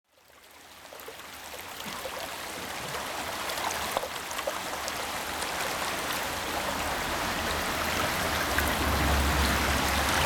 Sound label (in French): decalage bardo